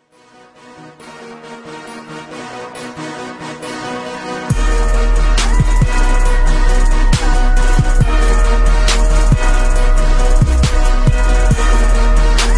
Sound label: instrumental